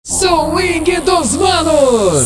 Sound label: swing